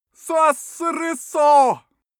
male shout arabian

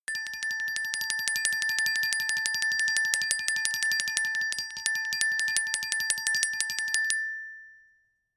glockenspiel tremolo low octave

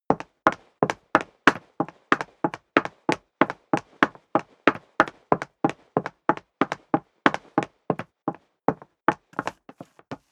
step leather shoes wood parquet run sequence; schritte

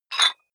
dumbbell pull up rattle soft, fitnessstudio

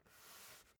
basketball turn swipe short

